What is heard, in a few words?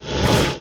Jump; Mawlek